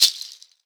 SHKR PIG DO, Synclavier Percussion; Synclavier Percussion 2; World & Orchestral; ILIO

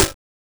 Snare, Genesis; Top Gear 2

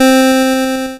C4, LSDJ Notes; LIMAT LSDJ kit

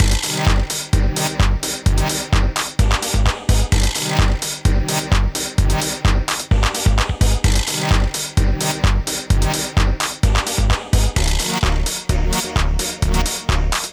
129 bpm, Old Skool House; Big Fish Audio; Construction Kits

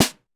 Mega Drums, Korg 01W

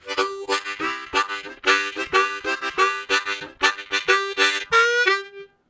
Chug, Harmonica Riffs; Zero G

